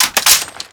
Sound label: tavor reload chamber, foley